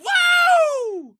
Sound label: woo